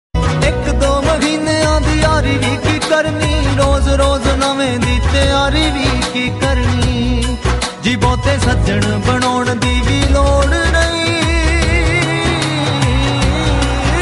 hindi

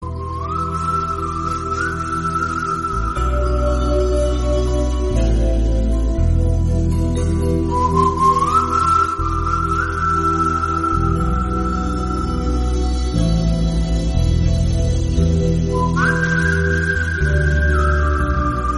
bgm flute

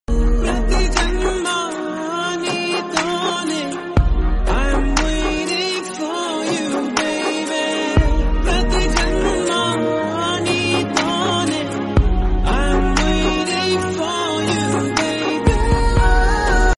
sad telugu

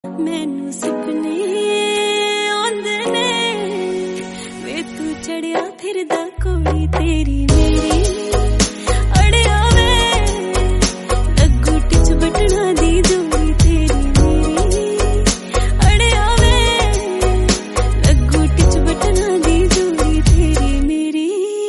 female version punjabi song

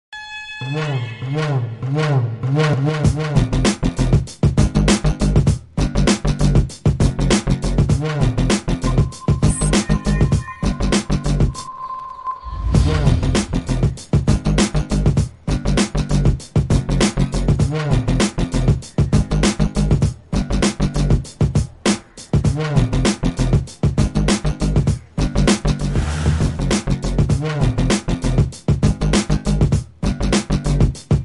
bgm theme; rowdy hero

